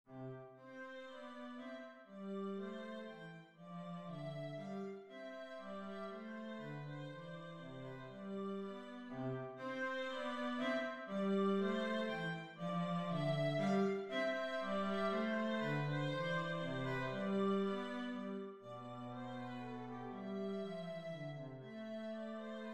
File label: passepied